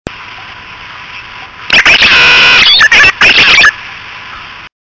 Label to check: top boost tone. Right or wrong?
Wrong